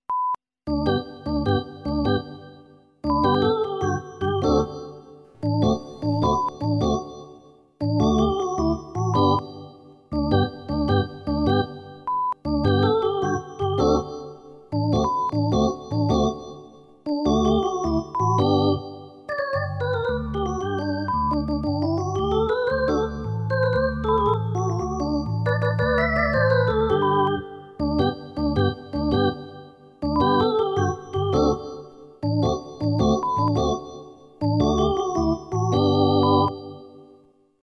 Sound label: baseball Music